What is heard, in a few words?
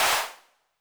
HAND CLAP Reverb Pitch Width, 909; classic machines